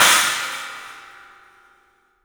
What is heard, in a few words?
CYMBALS; 3D Sound; 3D Drums